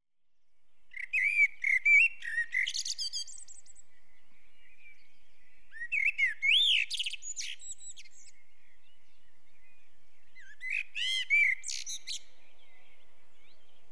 Blackbird (Turdus Merula) with quiet town garden, dawn chorus; British Birds; The BBC Sound Effects Library